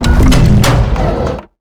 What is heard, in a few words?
biomech blocker taunt